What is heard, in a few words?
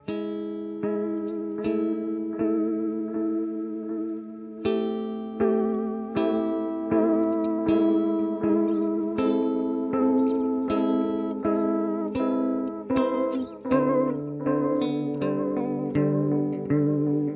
Chase Bliss Audio Thermae Modulation